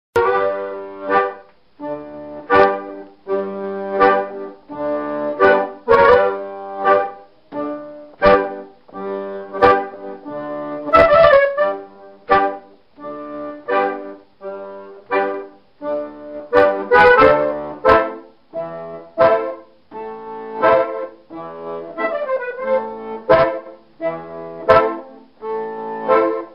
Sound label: greek